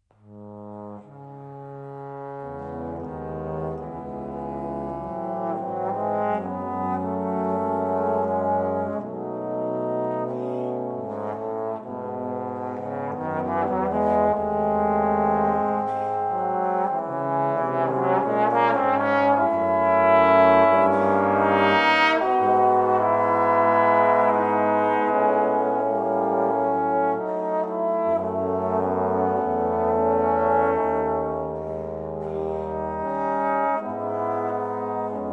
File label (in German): Trombone Attraction Langsamersatz